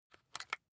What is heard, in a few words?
rock drill head remove regular; bohrer; soundpool